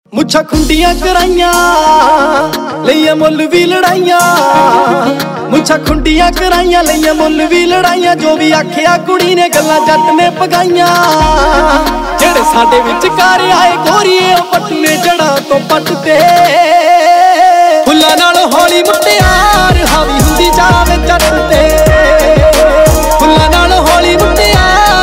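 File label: Ringtone, Punjabi Pop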